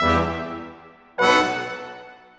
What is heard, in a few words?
Brass; Sonic Foundry (Sony Creative Software); Orchestral VOL-2; Modern 02